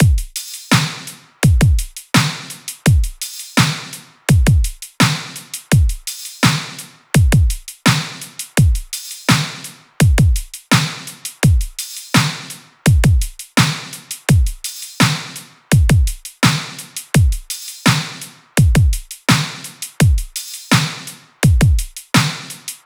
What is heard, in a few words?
drums 84, Drum Loops, Full Drums; Integra; Slate; MIDNIGHT Sample Pack